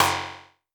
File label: Tempest Analog Snare